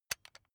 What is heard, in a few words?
electric button drill direction switch backward soft